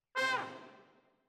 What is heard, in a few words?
Trumpet